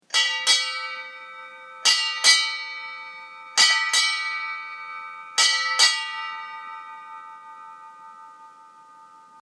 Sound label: Ship Bell Antique 8 Hits; Boats; Vehicles